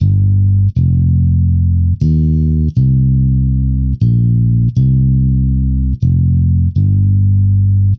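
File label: Bass 1 Rock G minor 120bpm; Guitar and Bass; Ableton; Factory Packs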